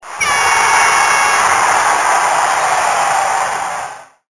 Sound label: cheering crowd